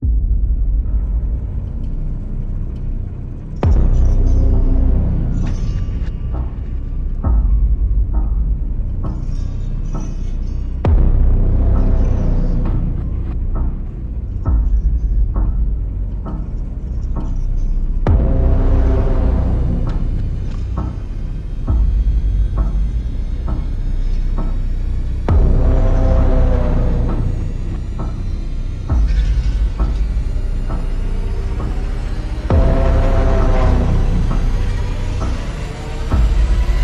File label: Twinkle Twinkle Horror, No Melody